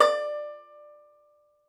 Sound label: Tenor Banjo; E-MU Producer Series Vol. 7 – Old World Instruments; Plucked Strings